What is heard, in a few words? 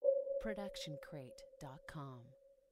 pin drop ambient; soundscrate